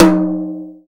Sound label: high tom drum one shot d sharp key, tom sound tuned to the D# note